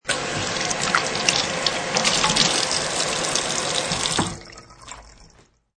Faucet, Kitchen Faucet runs for about 4 seconds and gets turned off; Kitchen Sound Effects; Household